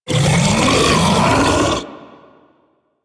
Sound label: feast enemy pull; DE; Warframe; Warframes; Grendel